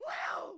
Valkyrie Attack Vocals

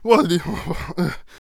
Traveller Attack Vocals

